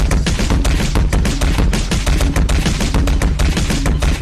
ragdoll impact sounds; gmod

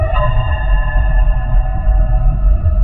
cursed horror sound effect

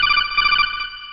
codec; metal gear solid

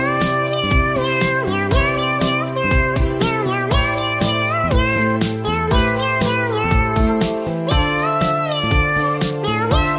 meow

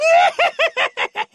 laught; goro majima

